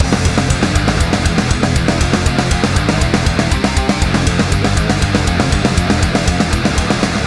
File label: cymbal check